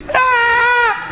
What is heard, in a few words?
scared ahh laugh